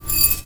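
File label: GLASS BRUSH, percussive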